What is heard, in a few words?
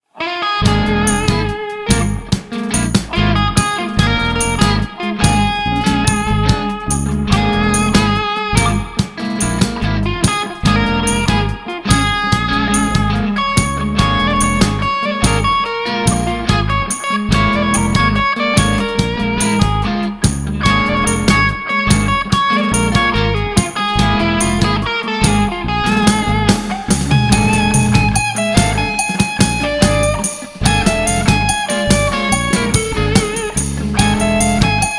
locrian bb3 bb7